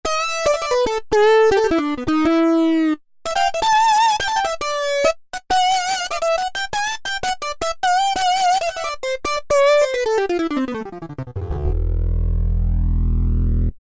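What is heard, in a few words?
Lead Jazz Synth, E-mu Morpheus; Factory Presets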